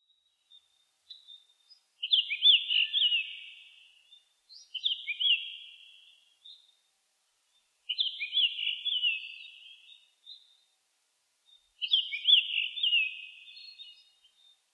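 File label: ikaru, Japanese grosbeak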